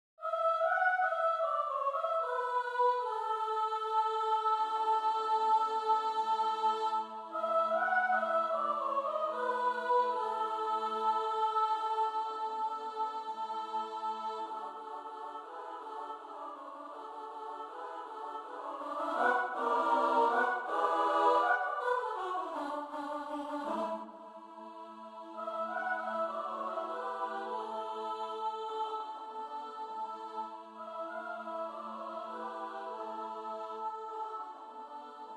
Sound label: midi kukulka; twardowski